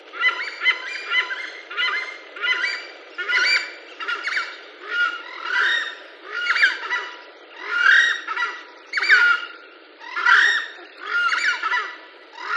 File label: Chauna torquata duet; Screamer; Duet of pair mates